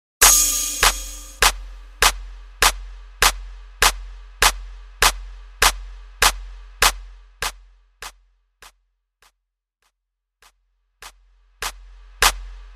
Moombahton; Mainstream VIP; Clap A Pella Starter

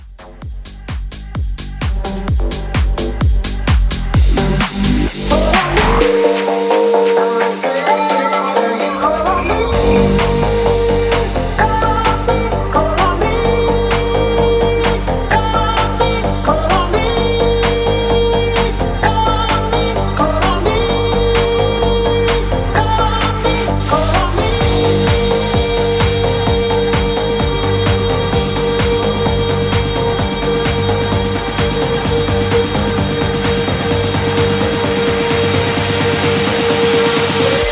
Edit, 129

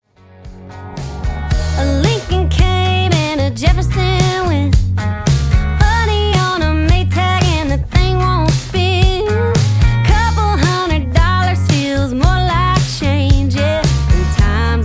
Country Dj Intro Outro 112 Bpm

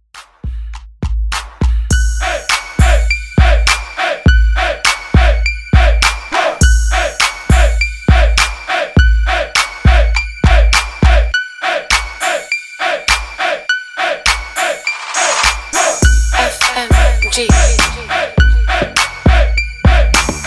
HipHop Top40; ReDrum; 102 BPM

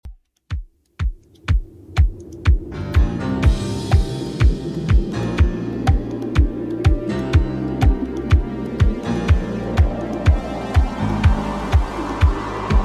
70s Redrum 123bpm

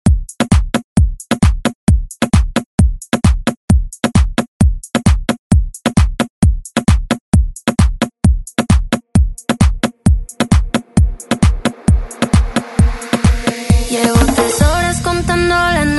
re drum; DJ Mhark

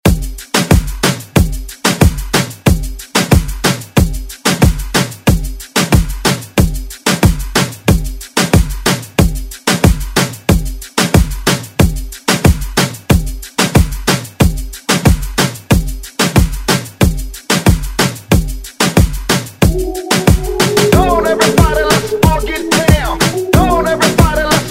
Moombahton Hype Intro

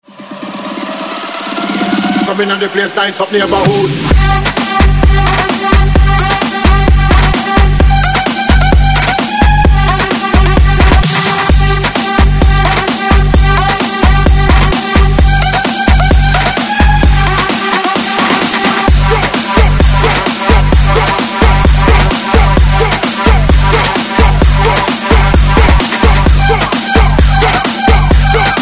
130Bpm BREAKMIX

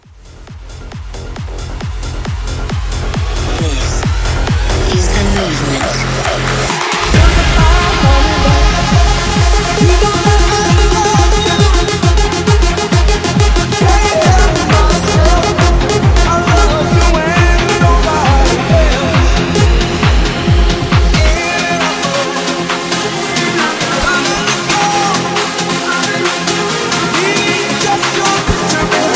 Big Room

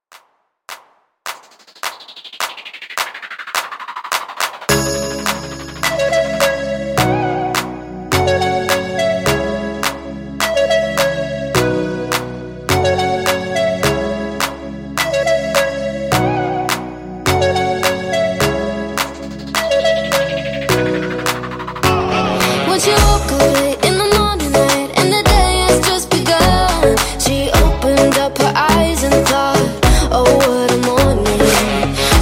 CLAPAPELLA IN OUT Scratch 100 BPM

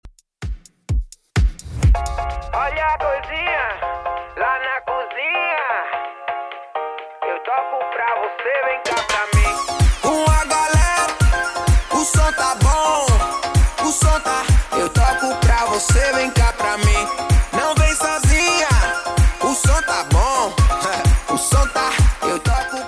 Afro Redrum 128bpm

